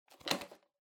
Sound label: Megaphone Hardware Noise